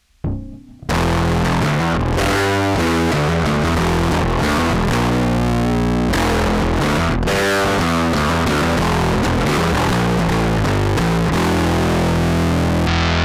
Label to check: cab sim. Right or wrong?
Right